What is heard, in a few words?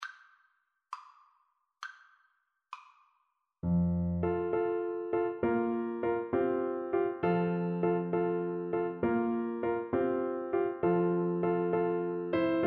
siciliano, Flute